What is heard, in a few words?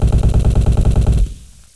war, war zone